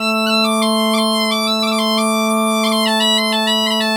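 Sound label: bagpipe 90, Celtic Flavours; USB Soundscan vol.42; AKAI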